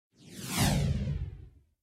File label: swoosh